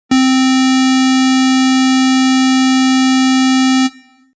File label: PWM